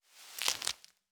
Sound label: gather fruit